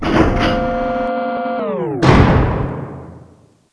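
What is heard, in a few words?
dr short, door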